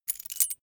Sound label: key ring mid size put down; objekte; schluessel